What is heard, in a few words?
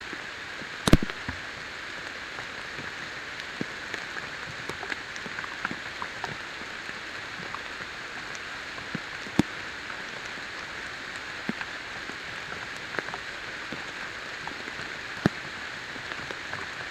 yamanakako sound